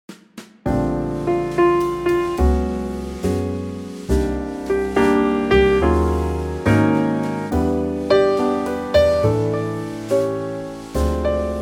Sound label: Trio Bb